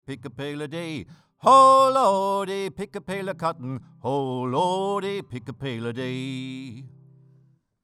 Isovox Mic Isovox Booth Singing Guitar 20cm No Noise